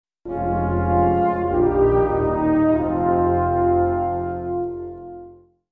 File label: ambience light side (short); Knights of the Old Republic II-The Sith Lords